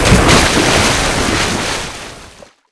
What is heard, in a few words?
Water Shild